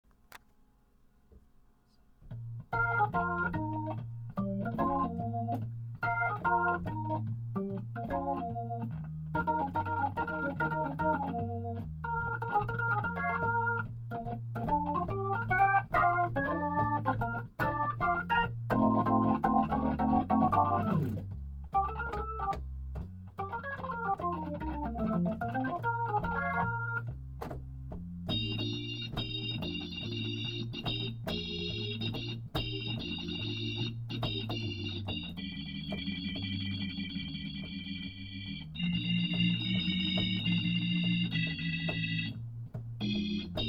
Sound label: hammond piano